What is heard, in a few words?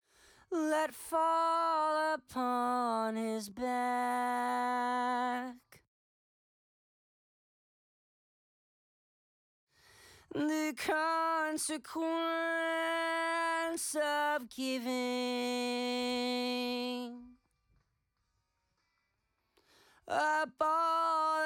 main vox